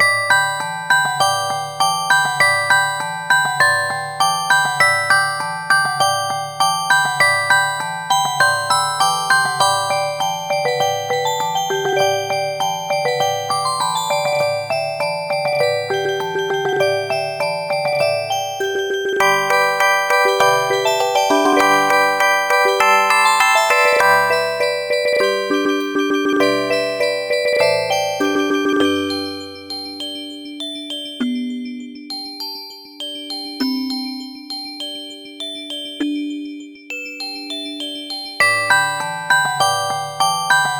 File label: Musicbox; Klein